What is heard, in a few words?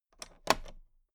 toolbox latch close soft